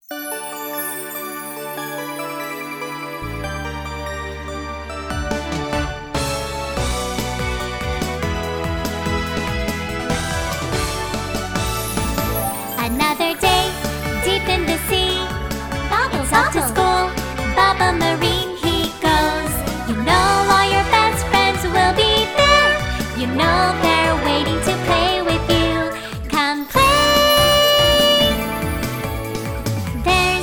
영어여 오프닝송

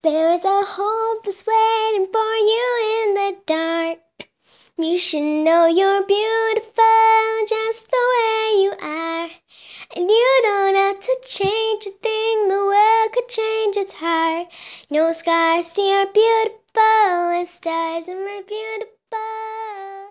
영어 여 여아 노래

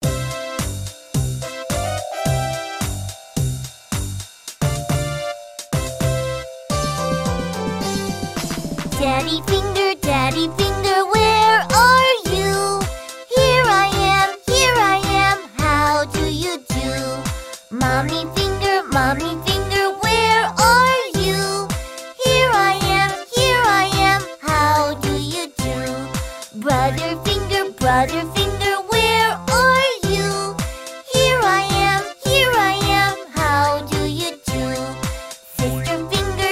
영어여 동요 남아목소리; Finger Family

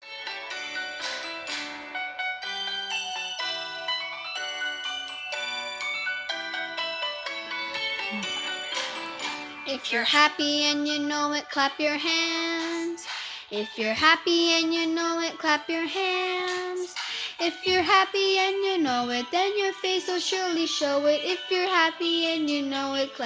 영어남, 아동 남아, 노래, happy and you know it; 남자

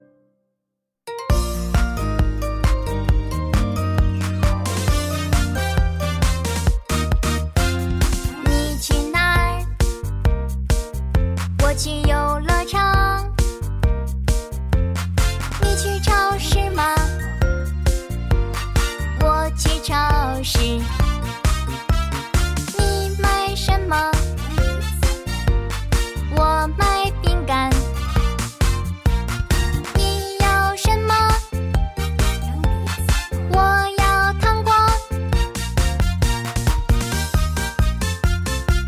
중국여 노래 기본톤

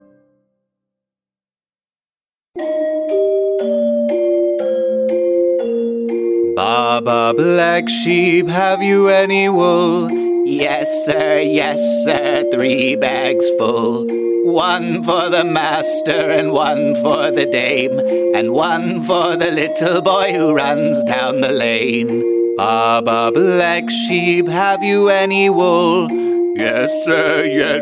영어남 동요, Baa Baa Black Sheep

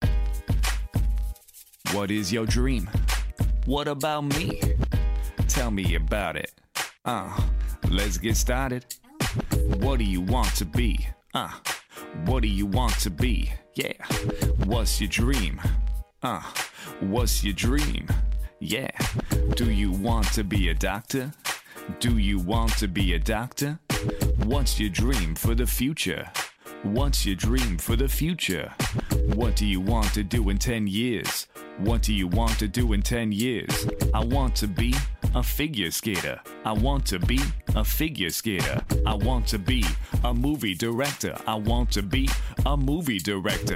영어남 랩

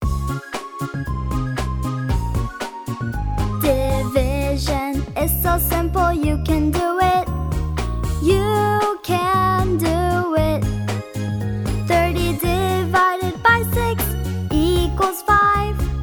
영어여 Song

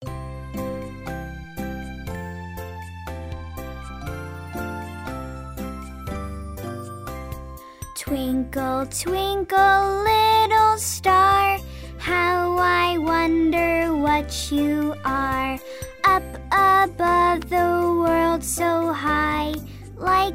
영어 여 여아 노래

